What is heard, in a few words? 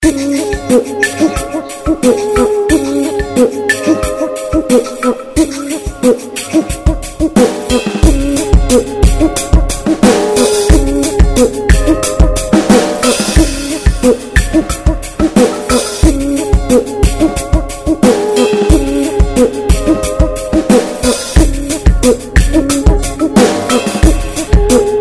new latin percussion; ringtones; latino